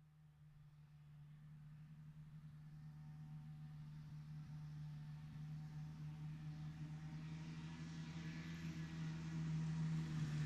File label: Motorbike passing by